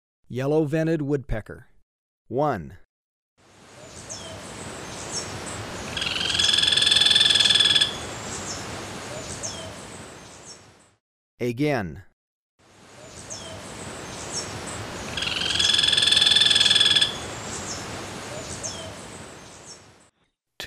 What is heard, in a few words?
Yellow-vented Woodpecker; S. America